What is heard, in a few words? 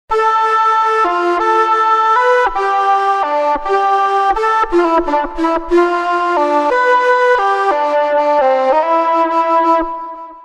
Noze Flt; TURBO VL